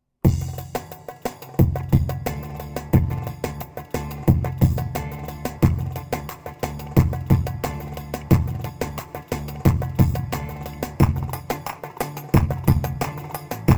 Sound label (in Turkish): tr ciftetelli 4, korg pa 500; tr karisik ritimler